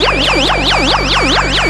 NRG SFX, Trance

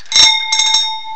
BIKE BELL; CMI Sound Library Rev 1.3; MACHINES

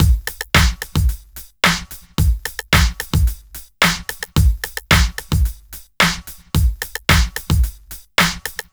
Club Tip, R&B Drums

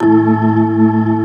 A2, Keyboards Old School; USB Soundscan vol.09; AKAI; BIG HAMM

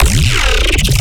BASS SHOT

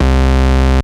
Analogue hits and octaves

